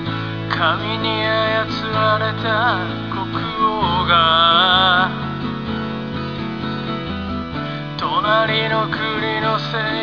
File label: beats